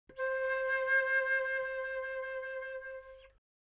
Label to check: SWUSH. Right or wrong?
Wrong